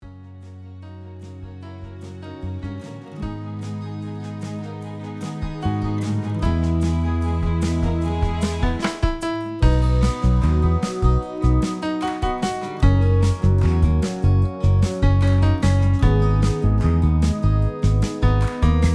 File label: chorus bridge karaoke